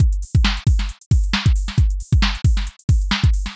broken beat breaks drum loop 135bpm 2 bars

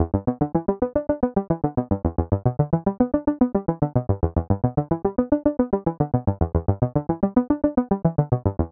synthwave arpeggio 110bpm e

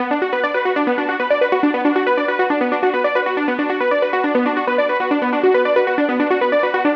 euphoric trance lead arpeggio 138bpm bm

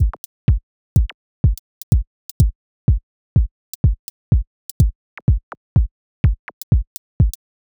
glitchy minimal house percussion loop 125bpm

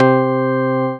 classic house organ stab in c

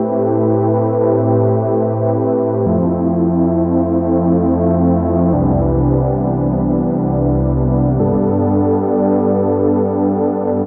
ambient chord progression 90 bpm 4 bars key am

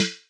boom bap snare

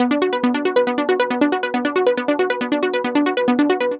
trance lead pluck in Bm

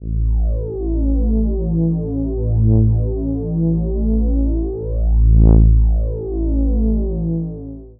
dark reese bass drone in a

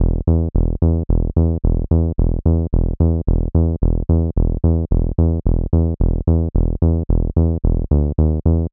110 bpm disco bassline 4 bars in e

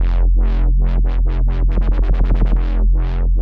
aggressive dubstep wobble 140bpm

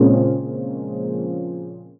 smooth deep house chord stab Bb

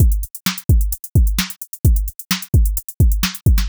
130 bpm breaks pattern 2 bars

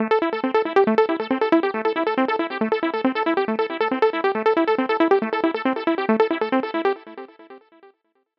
138 bpm trance lead arp 4 bars

